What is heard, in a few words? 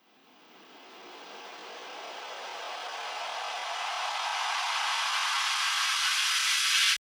137BPM Sweep Fx; Sound FX; Amajor